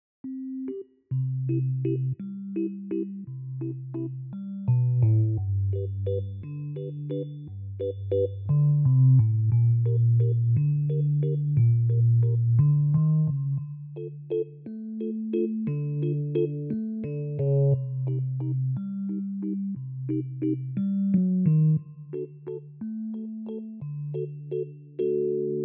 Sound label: Piano solo karoke